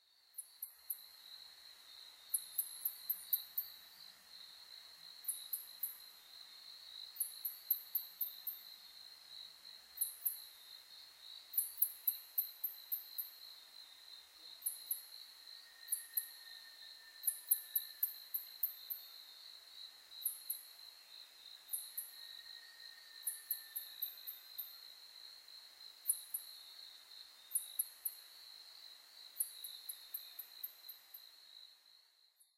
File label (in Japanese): koumori